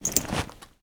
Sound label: Bag open